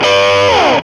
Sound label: GTR OD CHR, E.Guitar FX